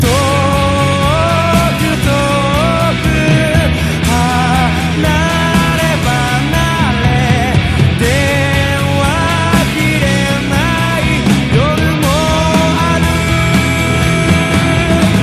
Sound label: ringtone